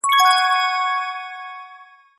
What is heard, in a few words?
HTC One M7 SMS, Ringtone